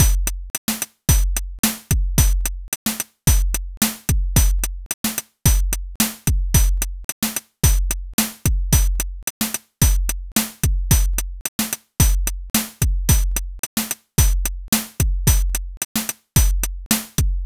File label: afrobeat pattern